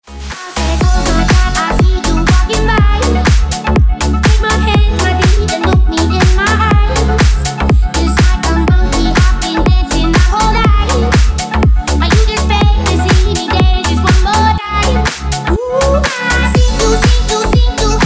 remix rington

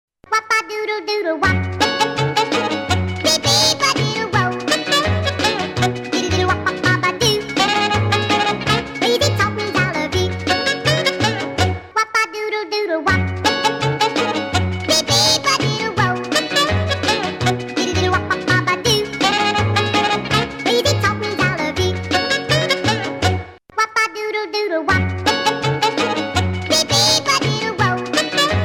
bass trac

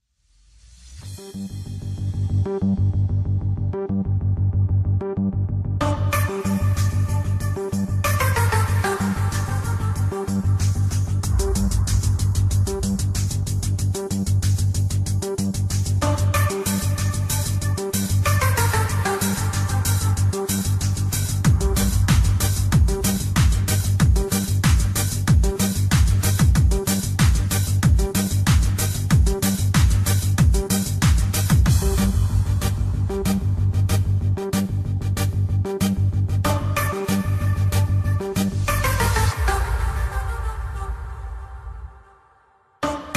Italo Disko; Russkie zvuki

